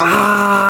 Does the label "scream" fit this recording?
Yes